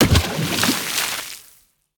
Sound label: boat; pirates